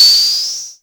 ms20 cr78 cymbal; MS-20 drum pack

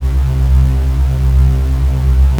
ms20 the hum; MS-20 layers

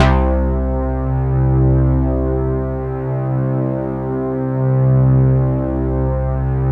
ms20 thunder bass; MS-20 layers

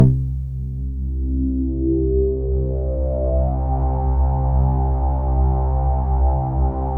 ms20 evil resonance; MS-20 layers

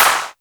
ms20 clap snare; MS-20 drum pack

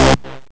8bit 16khz hard stab, MS-20; MS-20 Layers II

